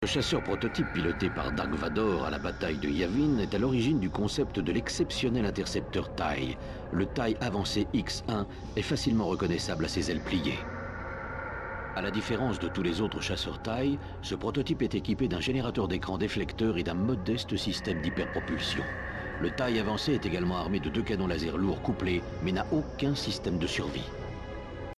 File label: TIE X1; Star Wars